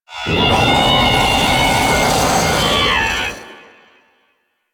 Vocal Attack, Enemies; Warframe; Teralyst; Ropalolyst

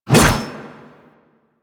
Reaper Melee Whoosh; Warframe; Wraith

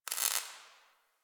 Destruction Electricity Sparks; Ambience; Warframe; Corpus Railjack

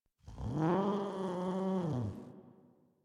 Kavat Vocal Idle; Warframe; Khora

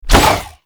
Star Whoosh, Infested Throwing Stars; Warframe; Weapons; Coda Pox

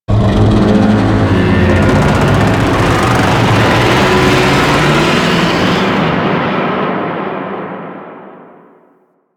Void Dragon Vocal Weakpoint Respawn; Enemies; Vocals; Warframe; Duviri

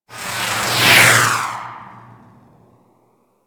Beam Gun Loop One Off 3D C, weapons; Player Path Beam; Fomorian

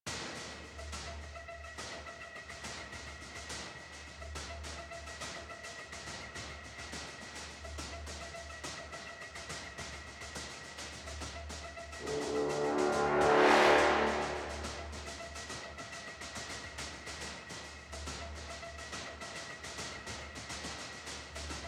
Cmbt Perc C, Music; Warframe; DE; Dynamic Music; Sacrifice One

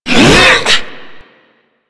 Hammer Boss Exertion, Vocals; Warframe

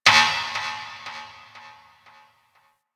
Ship Energy Knock; Ambience; Warframe; Corpus Ship Remaster

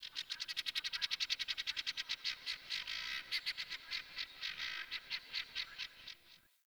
Forest Open Glade Bird Far; Ambience; Day

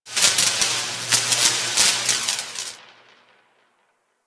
Electrical Spark; Ambience; Props; Warframe; Orokin

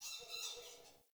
Under City Alley Critter

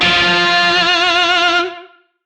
Shawzin F; Oct Two; Music; DE; Warframe; Lizzie Shawzin

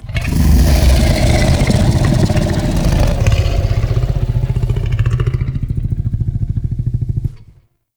Pit Monster Exhale, Infested; Sleep; Warframe

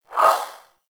Swing, Melee; Warframe; Weapons; Modular Melee

